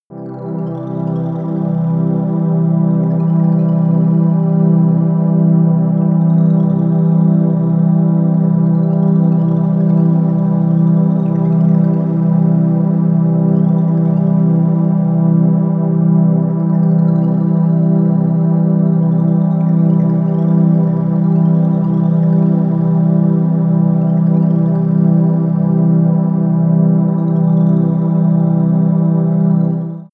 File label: relaxing energy, music elements